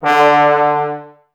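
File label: brass trombones D, Woodwind Brass Ensemble; 90 s Sample CDs; Miroslav Vitous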